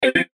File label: Chime Stutter Bleeps; MULTIMEDIA; Blastwave